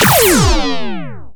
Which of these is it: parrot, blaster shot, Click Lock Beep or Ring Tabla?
blaster shot